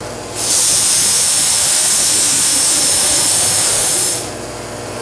loud pressurized air compressor release; tools

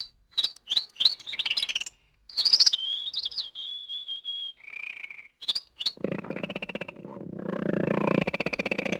cute creature harmonic chirps and purring; creatures

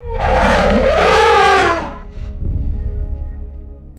powerful elephant roar and deep rumble; animals

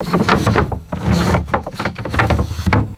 wooden drawer shaking and sliding open

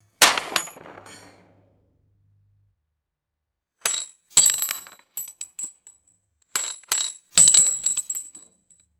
empty metal shell casings ejecting and bouncing

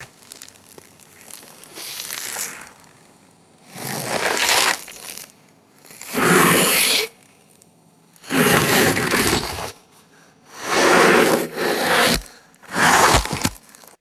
heavy body dragged across loose dirt

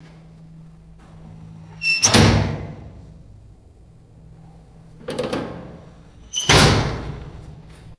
hollow metal vat door opening and closing; doors

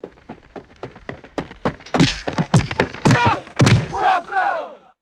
synchronized platoon turn and stomp

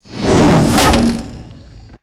heavy medieval ui whoosh and thunk

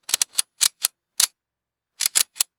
rapid mechanical metallic ratcheting clicks; guns

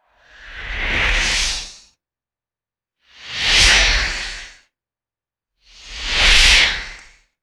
three fast breathy airy whooshes; swooshes

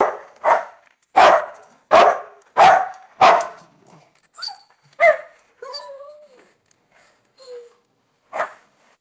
distant deep gruff dog barks; animals

